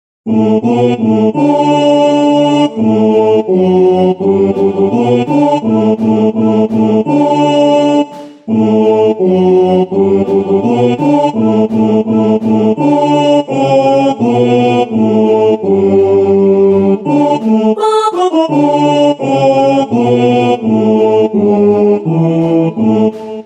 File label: baritone